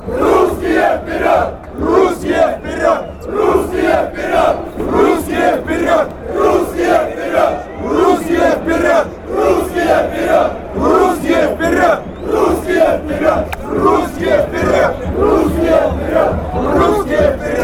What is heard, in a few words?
chant